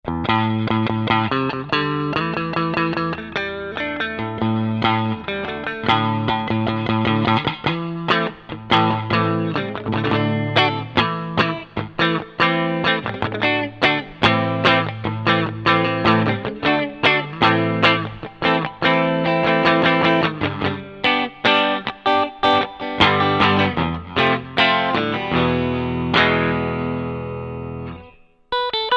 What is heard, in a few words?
ROCKN POPS